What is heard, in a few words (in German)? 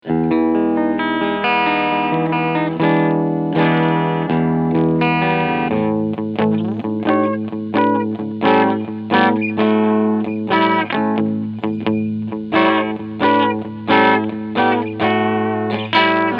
Maybach Albatroz 65 Cleansound